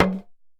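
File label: small tama hit; Best Service; World Colours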